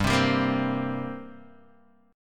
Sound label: G Minor Double Flat 5th, G, Guitar, Open D